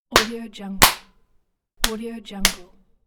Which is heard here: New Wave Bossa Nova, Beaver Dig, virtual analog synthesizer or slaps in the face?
slaps in the face